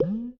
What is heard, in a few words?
Slime Vox Big; Going Under